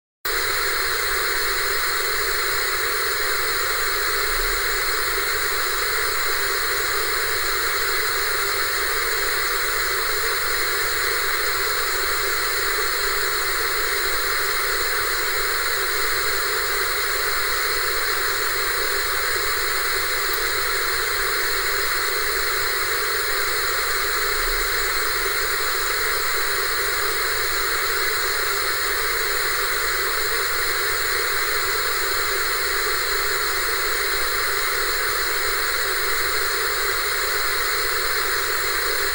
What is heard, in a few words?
TV Static